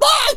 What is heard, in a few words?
Sensei Death Vocals